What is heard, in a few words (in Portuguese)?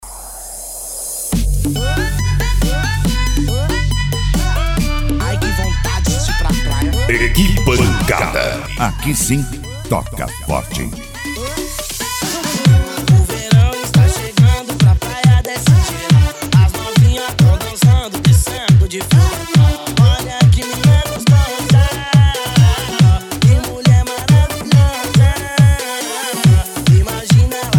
pancada e eletro funk